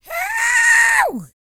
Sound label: YELL, MALE HUMAN; Spectrasonics Vocal Planet